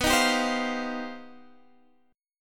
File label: C Minor Major 11th B